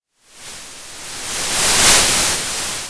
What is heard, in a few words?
Sound of waves crashing on a shore